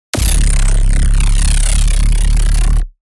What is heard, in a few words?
Distorted energy beam power blast